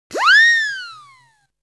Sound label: spinning whistle toy